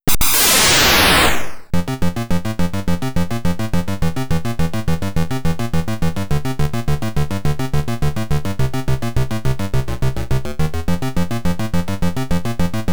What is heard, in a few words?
Pinball Action, Bowling BGM; Pinball Action GREEN